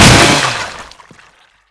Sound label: chainsaw hit, weapons